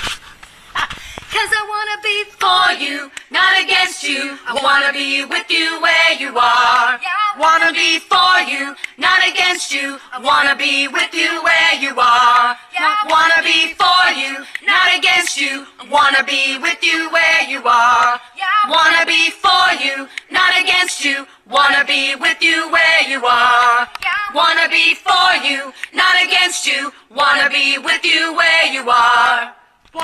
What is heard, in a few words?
baptize alto